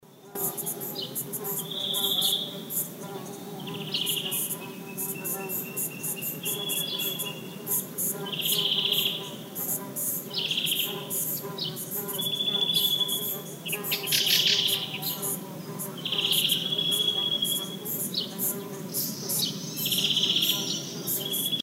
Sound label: Animal Sounds